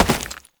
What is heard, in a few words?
Step Rock, Foley; Warframe; Oraxia